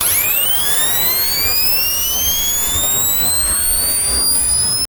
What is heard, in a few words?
big laser charging up